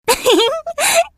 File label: giggle; amy rose